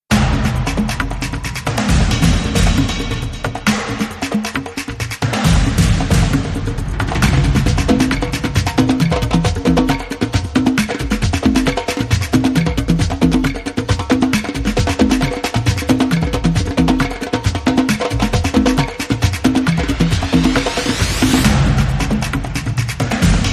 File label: Small Drums